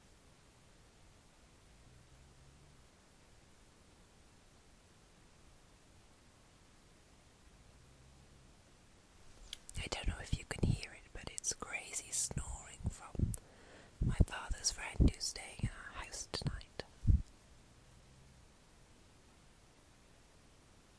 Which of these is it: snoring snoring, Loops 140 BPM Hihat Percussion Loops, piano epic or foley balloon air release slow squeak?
snoring snoring